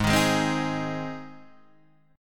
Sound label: Ab Major, down; strummed; JGuitar